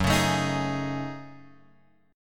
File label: F sharp Minor 6th Add 9th, Guitar; F#m6add9 Chord {2 4 X 2 4 4} strummed